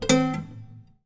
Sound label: Classical Guitar Double Note Plink